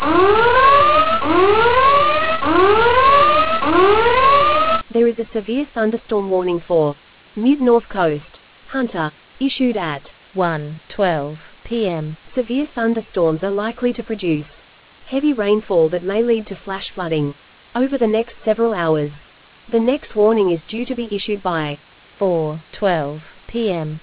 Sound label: storm audio